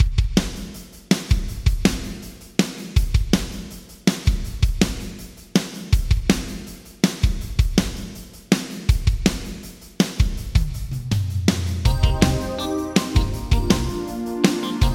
Drums Yamaha ProR3 Short Perc Plate; Short Perc Plate 2; Vintage Digital

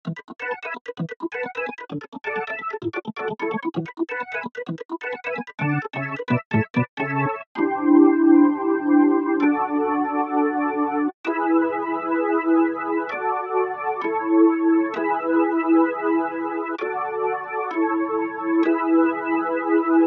Organ Shimmer

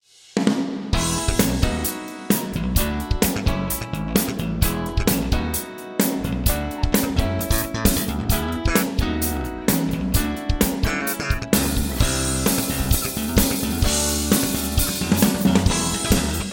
SP2016 Reverb Hi Dens Plate

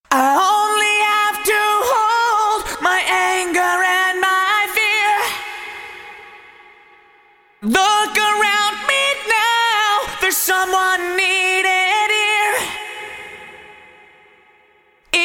Vocals Roland SRV 3030 Real Cathedral; Vintage Digital

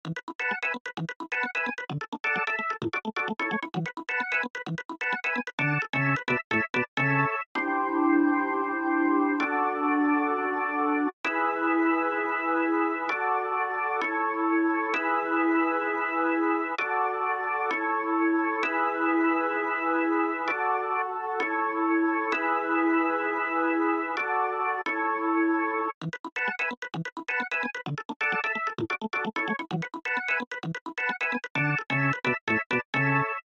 Organ Dry

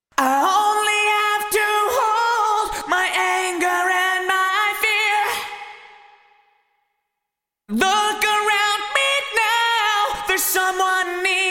Vocal Korg DRV 2000 Large Vocal Reverb; Vintage Digital

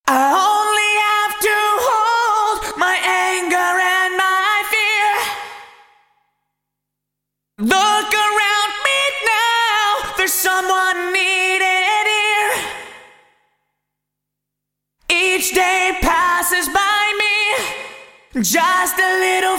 Vocals Roland SRV 3030 Lively Room; Vintage Digital

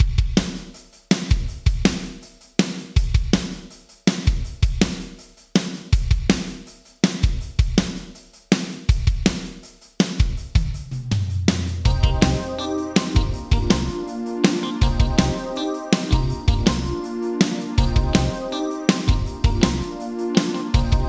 Drums Yamaha ProR3 Bright Studio; Vintage Digital

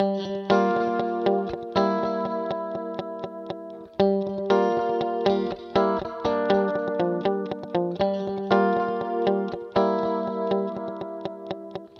Guitar Yamaha D5000 Mod Delay; Vintage Digital

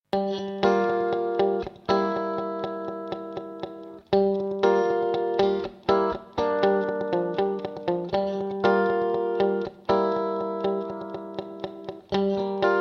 Guitar Roland SRV 330 Guitar Chamber; Vintage Digital

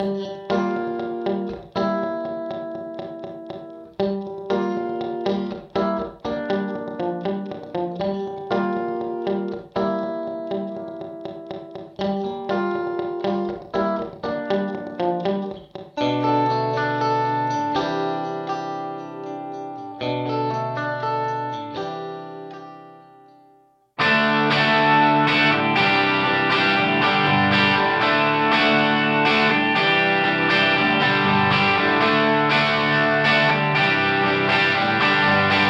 Guitar Sony HR MP5 Wood Room; Vintage Digital

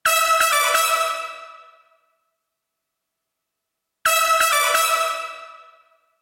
Brass Sony HR MP5 NY City Loft; Vintage Digital

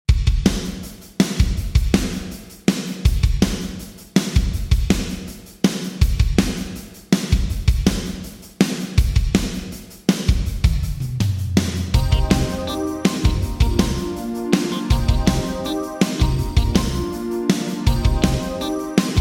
Drums Yamaha SPX900 Room; Room 1; Vintage Digital

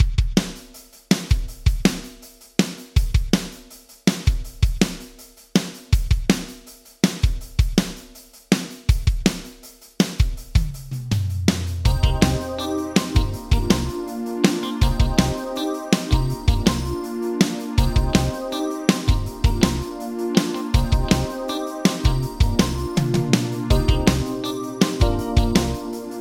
Drums Yamaha Rev7 ER1 Mode 6; Vintage Digital

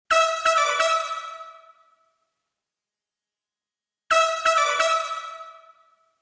Brass AMS RMX 16 Ambience; Vintage Digital

